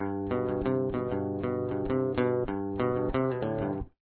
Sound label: intro riff, guitar